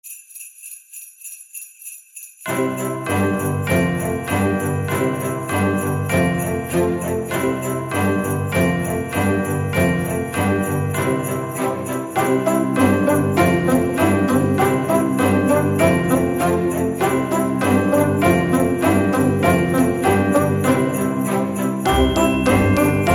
The Sleigh Bells, christmas

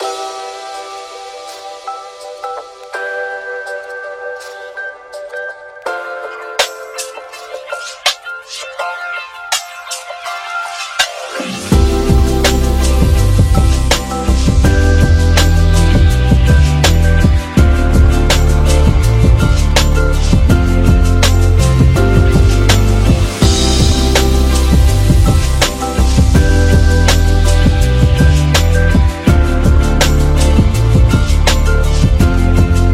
Lofi

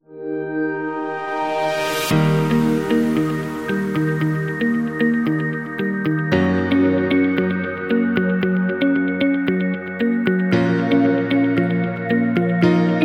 Background Interview

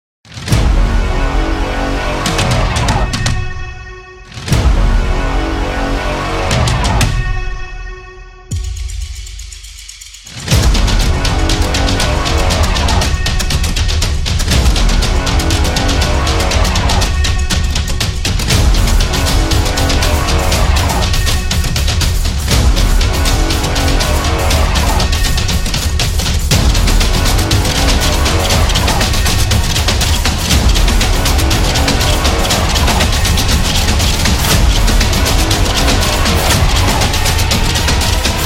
Epic Trailer Build Up; monster attack

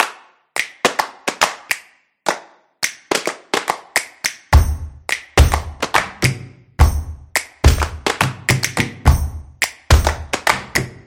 Claps Energetic Street Percussion; percussive nation vol 2

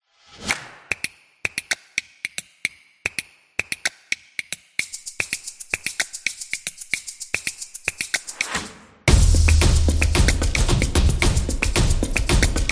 The Percussion Rhythm

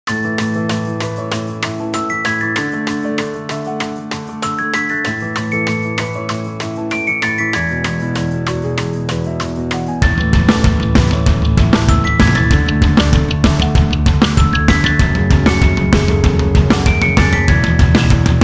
Indie Rock Motivation, happy music

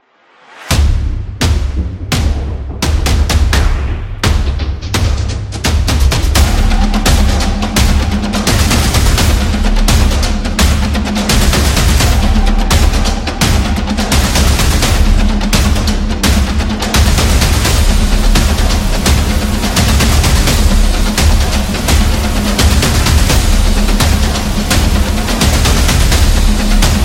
War Drum; monster attack

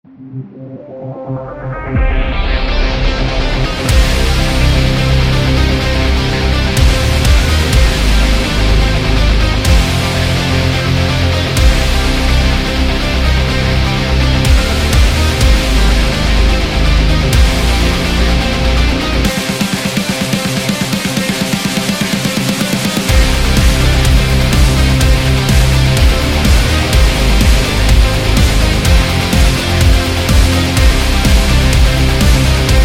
That Metal

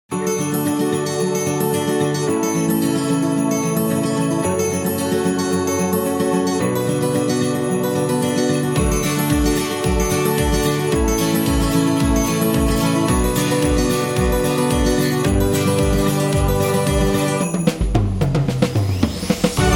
Christmas Happy Song; xmas

